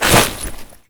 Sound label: jump default; STALKER; IWP